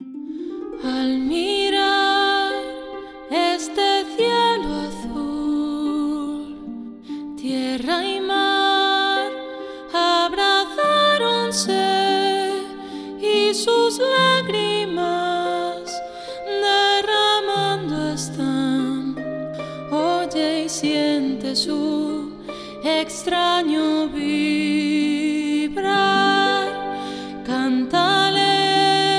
arpa voz; noahmund

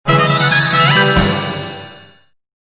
Fanfarria; Abrapalabra La Magia de Aprender a Leer

